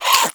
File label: Vocal Attack, Hunting Critters; Nexifera